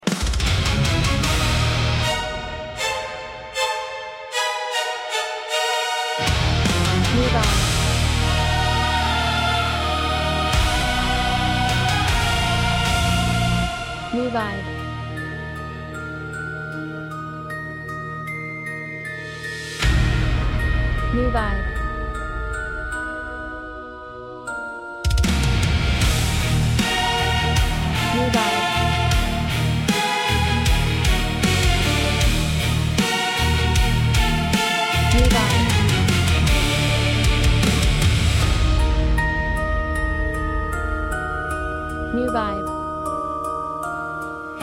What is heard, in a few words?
Gothic metal cinematic